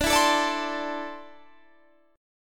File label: D 7th Suspended 2nd down, chord; D7sus2; strummed